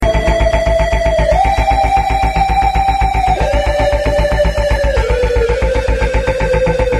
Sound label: Horror Theme